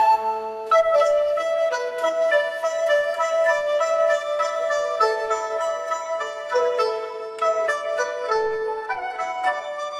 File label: japanese traditional, musicgen